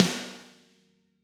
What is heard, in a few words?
Snare Crack; apulSoft apTrigga2; Steven Slate Drums